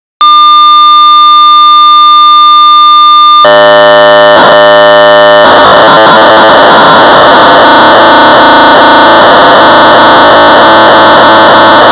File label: Sorcerer 1200 baud; LeftScroll v1.0; 1982; Roy Stockman; BASIC